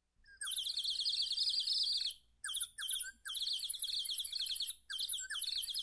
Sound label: High pitched bird chirping sound